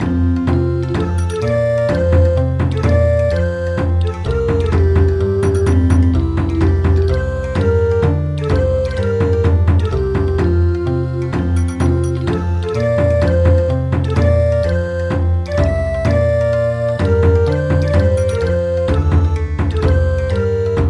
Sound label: Bon Odori